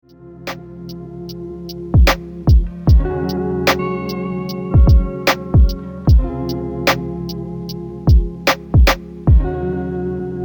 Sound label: lofi